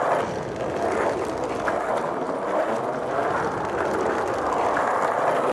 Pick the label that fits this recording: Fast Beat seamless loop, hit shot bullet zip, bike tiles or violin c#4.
bike tiles